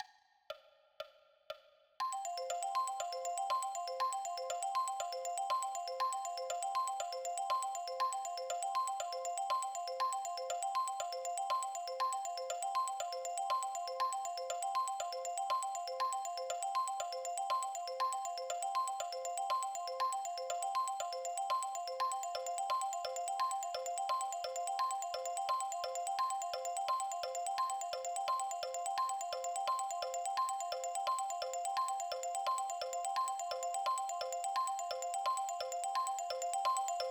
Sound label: Marimba